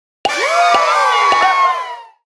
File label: celebrate